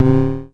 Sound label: FREQ MOD, Dual Percussion Synthesiser; Klone